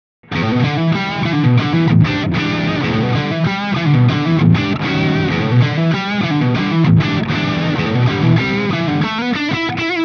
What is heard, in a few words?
MID plus DARK; GRAVITY SPOT